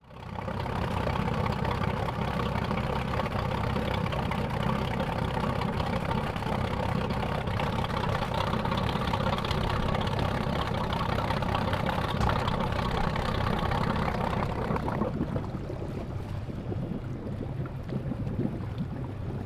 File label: Vehicle Boat Engine Idling And Dropping Into Water; transportation